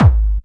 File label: kick techno, drums; Techno Trance Essentials